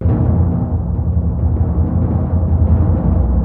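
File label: timpani C#, Synclavier Percussion; ILIO; Synclavier Percussion 2; World & Orchestral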